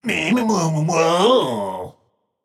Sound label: tongue; smite; zeus